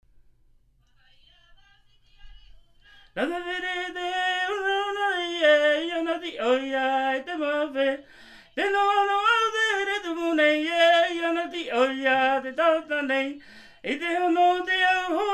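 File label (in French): Himene tarava Tahiti, chants polyphoniques maohi; Maru teitei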